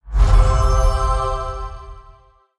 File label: Item Upgrade; Airborne Motocross; Windows